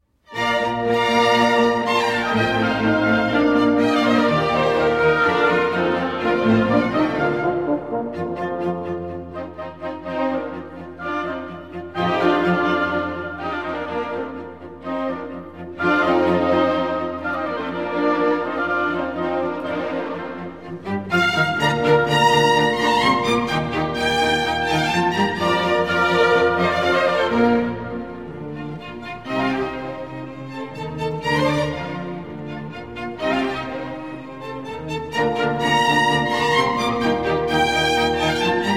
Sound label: Concerto No. 1 in B-Flat Major for Violin and Orchestra